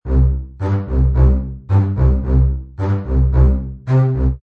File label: Orchestral bass loop staccato C Major 110 bpm; String Loops